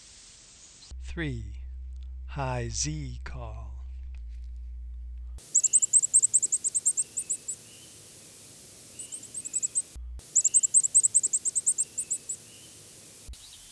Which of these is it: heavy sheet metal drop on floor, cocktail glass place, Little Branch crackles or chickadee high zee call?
chickadee high zee call